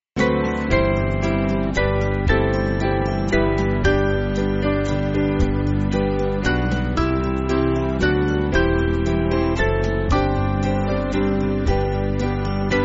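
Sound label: Band, Backing Files for Churches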